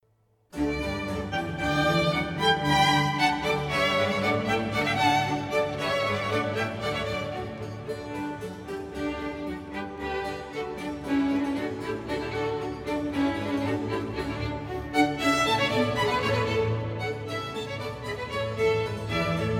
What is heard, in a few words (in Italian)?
Sinfonia a quattro allegro